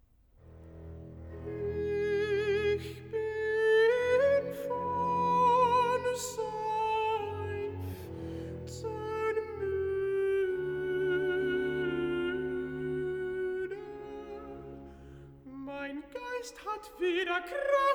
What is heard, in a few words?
Recitativo (Alt); vocal; alto; BWV135; Ach Herr mich armen Sьnder; Ich bin von Seufzen mьde; BACH